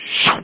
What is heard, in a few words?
thread; Ultimate Games No. 2; Amiga-CD32-CDTV; viper aga